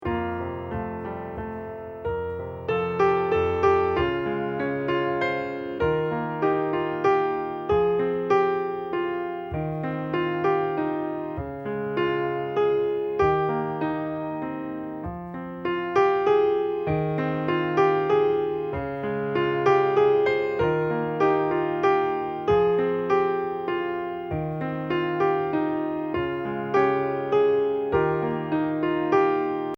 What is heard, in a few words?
accomp